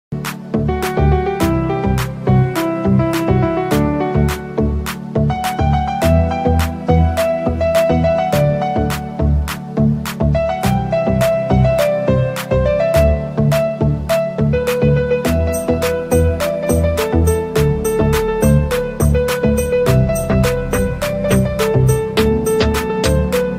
best new iphone xs stylish ringtone

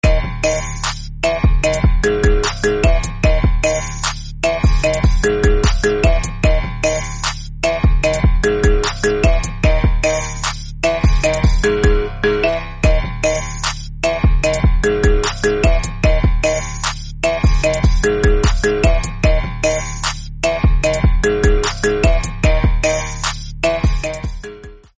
iphone marimba remix ringtone

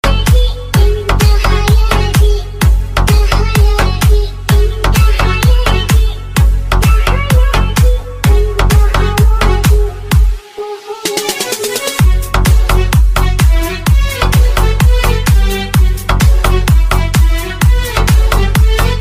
arabian ringtone

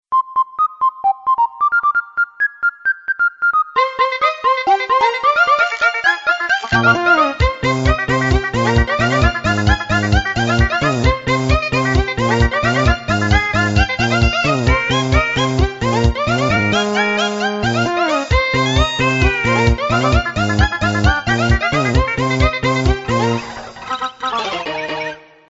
crazy dj ringtone

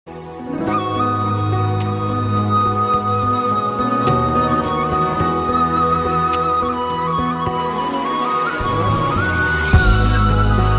Arabic flute ringtone

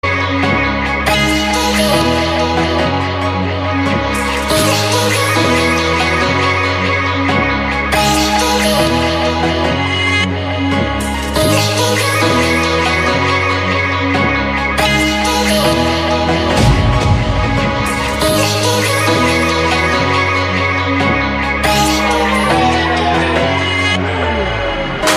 trance ringtone

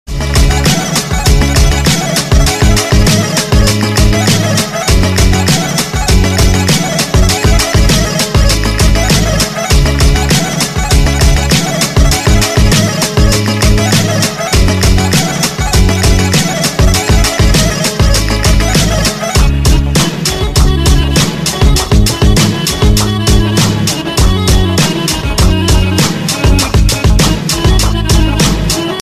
melody ring ringtone

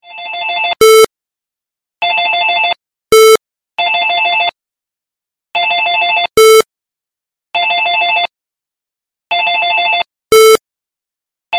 s5 wake up clock ringtone

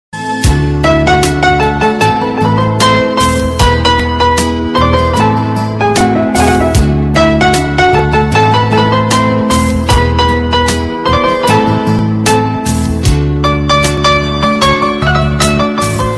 bollywood instrumental ringtone

